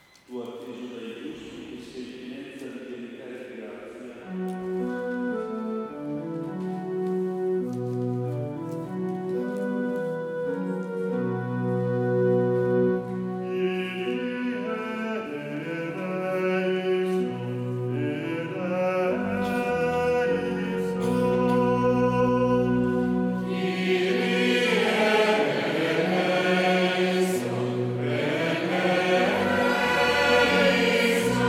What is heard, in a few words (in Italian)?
Kirye Eleison Coro; Natale 2018 Viglia ore 24